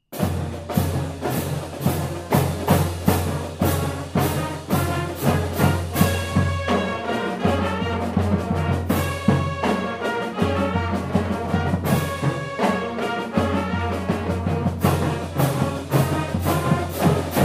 Shout it Out, marching band; hs marching band stands music and warmups

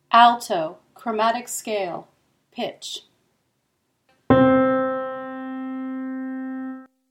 alto 2 chromatic; all-state choir

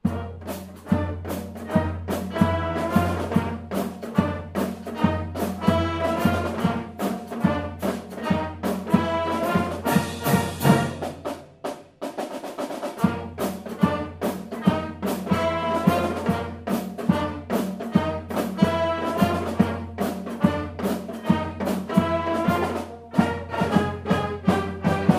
Jazz; warmups; hs marching band stands music and warmups

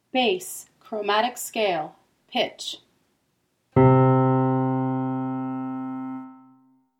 bass chromatic; all-state choir

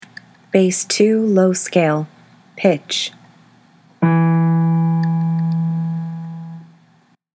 bass scales low; all-state choir

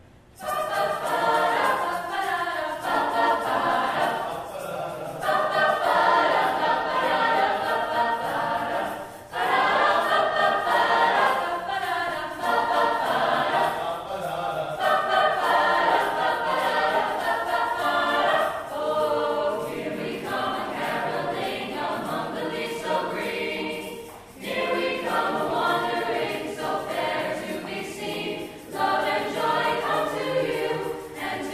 Here We Come a Caroling Vocal Ensemble; 2015 hs holiday runout

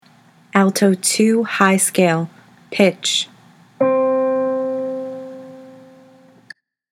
alto scales high; all-state choir

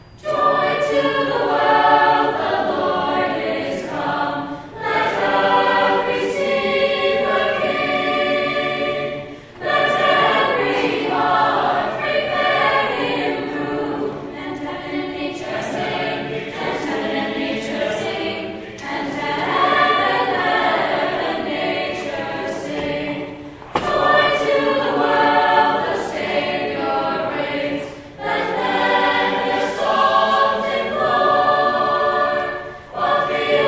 Vocal Ensemble; Joy To the World

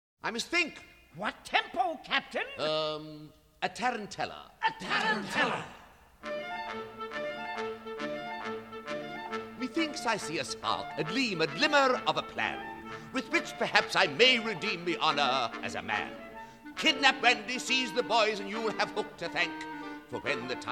Tarantella; Spring Musical

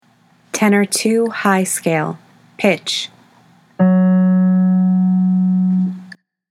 tenor scales high; all-state choir

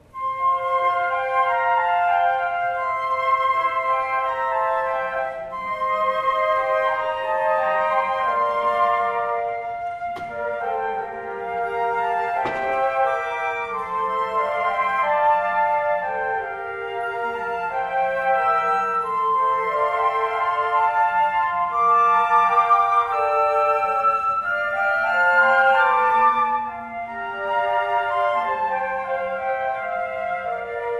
Theme from Flute Quartet

